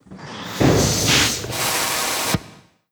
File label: event airlock; Stellaris